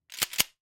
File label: Shotgun Racking; SoundsCrate